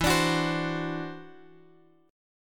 E 7th Suspended 2nd Sharp 5th, E, down, Guitar, Drop D